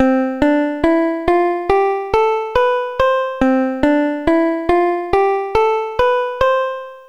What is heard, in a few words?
c scale; Dr. T's MIDI Recording Studio